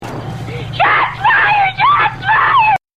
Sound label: shots fired